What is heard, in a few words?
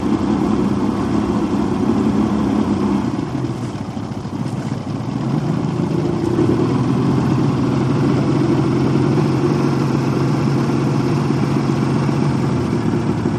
150 hp Mercury Boat Pull Up Shut Off, On Board